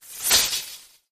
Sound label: Harsh Crashy Sweep